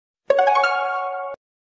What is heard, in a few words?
Notification Tone; Clash Of Clans